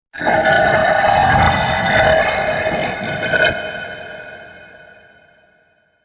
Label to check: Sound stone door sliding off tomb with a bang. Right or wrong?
Right